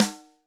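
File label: Snr Piccolo, snare; Alesis D4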